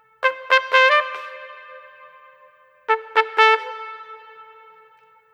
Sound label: Trumpet Solo, труба